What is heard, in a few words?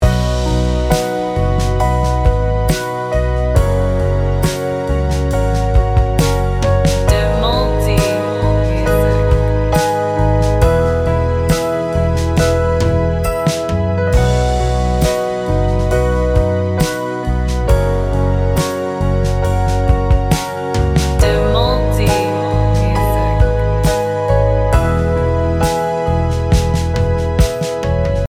instrumental ballad; Setiap orang punya caranya sendiri mencari arti hidup.